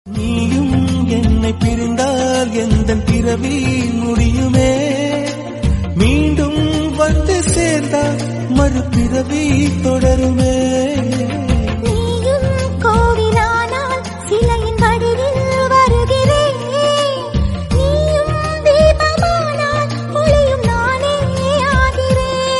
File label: Love Feeling Ringtones in Tamil